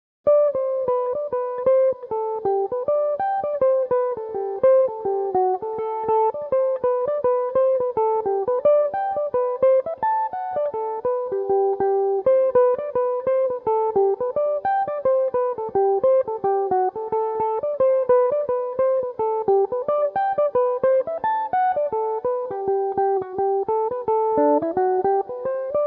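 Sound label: G major jig, mandolin; Global Warming; Belmuse EM-190 electric mandolin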